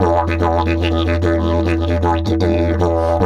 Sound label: E 150, DIDGE RIFFS; Big Fish Audio - Didgeridoo and Other Primitive Instruments